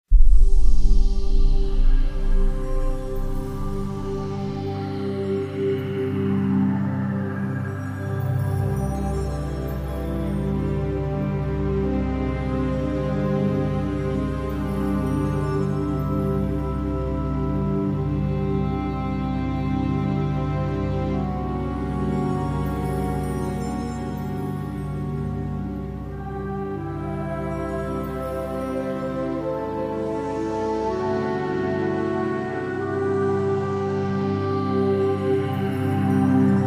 menu music

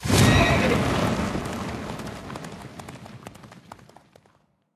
Horses; Hero Jacko

